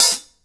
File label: Hi Hats; InVision Interactive; Lightware VOL-1